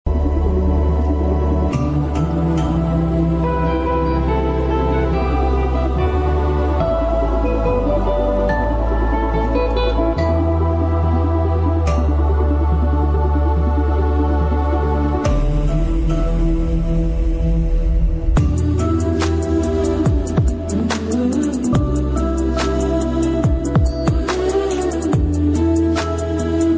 instrumental music ringtone; vijay deverkonda

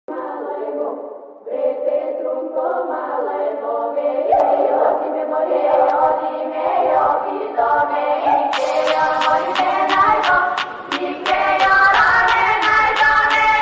attitude ringtone bgm ringtones english ringtone

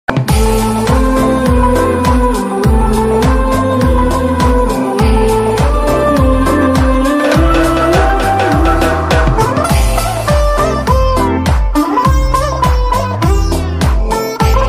love bgm ringtone, telugu love bgm; alludu adhurs movie

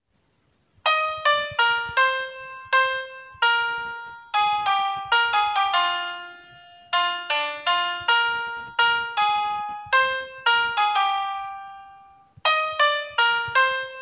music instrumental ringtone

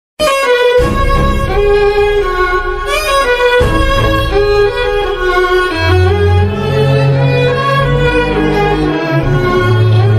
love ringtone; taqdeer movie

